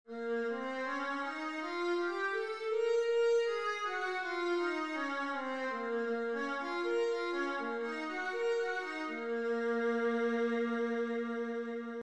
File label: viola b flat minor, scales